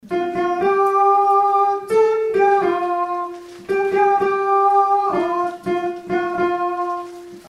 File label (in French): altos